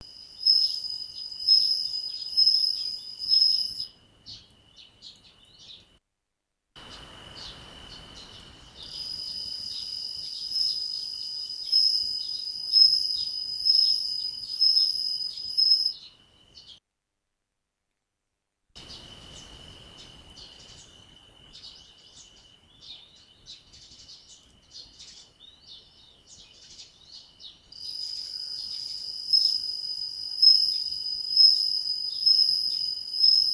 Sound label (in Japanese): suzumushi